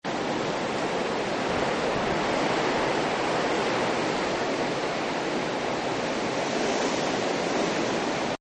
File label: Windy; Weather; Partners In Rhyme